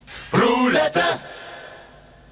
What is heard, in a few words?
silence